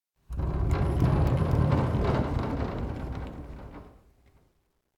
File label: door classroom open peek; anemoi